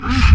jump; quake2; cero

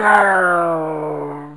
death; quake2; madpear

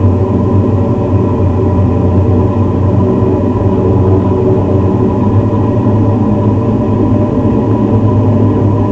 spooky atmosphere; quake2; fox

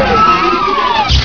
close, plats; quake2

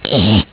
gurp; quake2; cheese

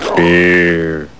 gurp; quake2; homer1 4

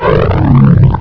death; quake2; ontra

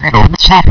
gurp; quake2; massm2ontra

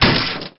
fall; quake2; droid

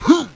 jump; quake2; ranger

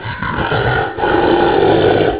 drown; quake2; minitank

